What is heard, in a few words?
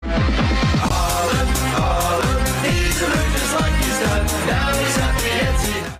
haaland chant